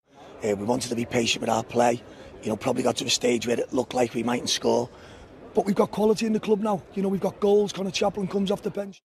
voice crack

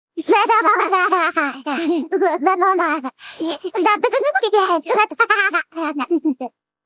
laughing; my melody

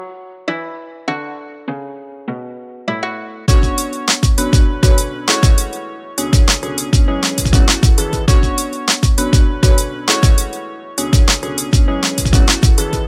synth grooves

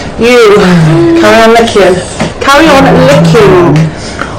licking loud

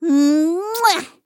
mwahhh

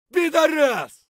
tarkov chvk scream

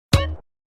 cheat sound; gta

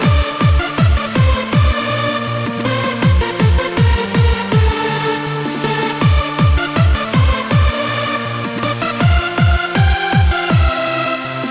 hardcore trance

